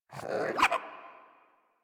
Puppy Idle Hiccup; Enemies; Vocals; Kubrow; Warframe

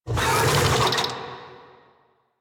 Infested Big Ashen Vocal Attack; Warframe

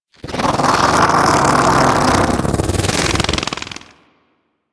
Bug Vocal Idle; characters; Warframe; Hunting Critters; Inf K Drive Bug

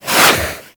Boomerang Whoosh

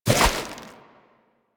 Infested Fishing Spear Charge; Warframe

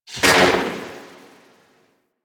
Microwave Pistol Tail; Grineer

